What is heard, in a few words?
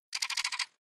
Sheep Voice; Doodle Jump 2